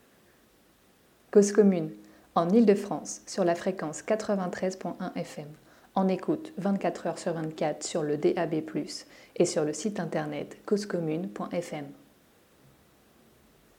jingle

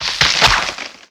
wose attack; wesnoth

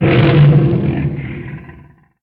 drake die; wesnoth

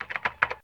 chat; wesnoth

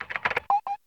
chat highlight; wesnoth

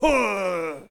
ogre die; wesnoth

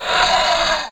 lich hit; wesnoth

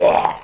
zombie hit; wesnoth

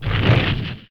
torch miss; wesnoth

